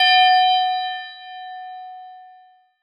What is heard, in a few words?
bell F#5; osu